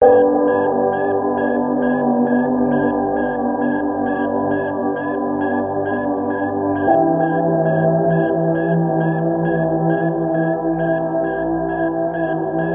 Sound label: outro background